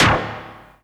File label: Synth Sound; Vengeance Samples; VEE Synth Shots